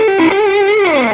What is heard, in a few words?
Riff; ExChaos